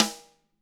DW rim; ILIO

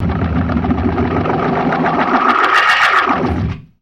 GLISS, ALL STOPS; InVision Interactive; Keith Emerson

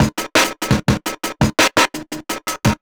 Drop Da Acid Beat, Drum N Bass; Sonic Foundry (Sony Creative Software); Knutrix Drum & Bass

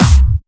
Distortion Kicks, Bassdrums; Vengeance; Essential Clubsounds Vol.4

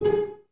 CES G#4 Pizz, Cellos, String Ensembles; Miroslav Vitous

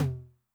MOD Noise, 909mod MID TOM; classic machines; MT - MID TOM

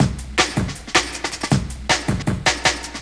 Calling Break, Loops; m8 breaks

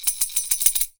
YAQUI; AKAI S6000 CD-ROM - WorldSounds

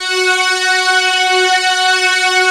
Prophet Five

